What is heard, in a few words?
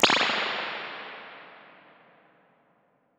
Springs Awesome Stereo Spring, ImpulseResponses; Ableton Live 12 Lite; Hybrid